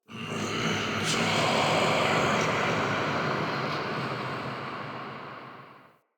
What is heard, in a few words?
Day Texture, Ambience; Glades Of Andurin